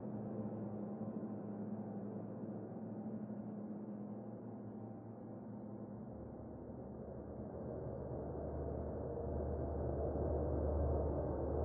Sinister Ambience LOOP; unrest indigo

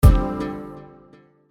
Race Stop; Summer Catchers

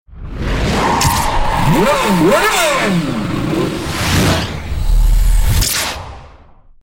Speed Car; Burnout Paradise

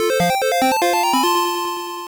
Picture Complete; Miimi to Taata no Wai Wai Oekaki Doubutsuen; Pico

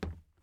plastic step

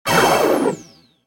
UI Fight Lose; Blue Guardians

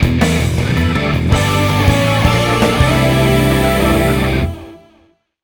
Mission Clear; Airforce Delta Strike; ps2

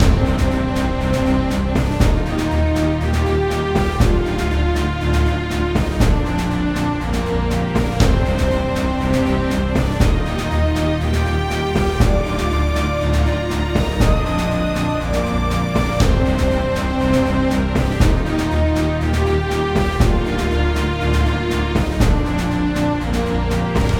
Game Over Loop; Imphenzia Soundtrack; Battle Zone Level Kit

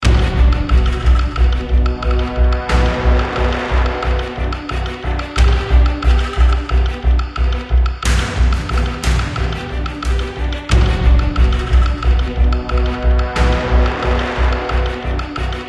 game combat loop; Arcane Quest 3

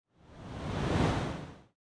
time hole swoosh; time travel

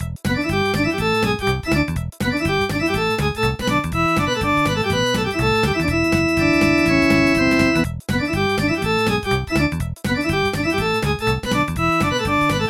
Automotive Race; super solvers gizmos gadgets

